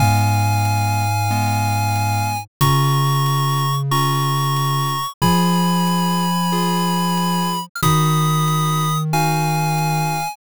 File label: bgm mellow